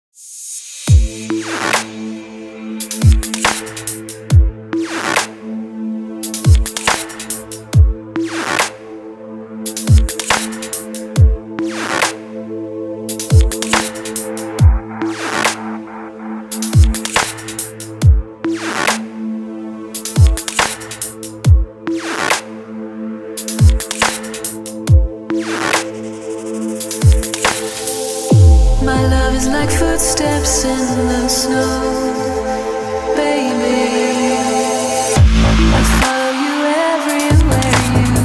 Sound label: remix dubstep